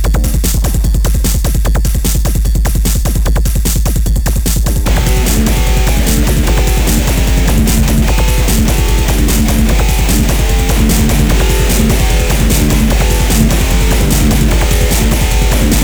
tokyo music; Alien Arena

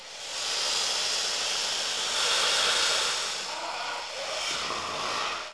hiss

